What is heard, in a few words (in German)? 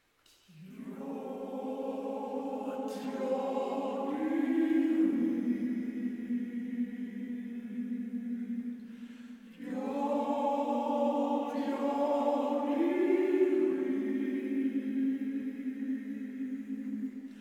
Andachtsjodler